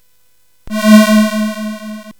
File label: Dark Castle, CSG8580R5